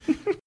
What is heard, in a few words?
quiet giggle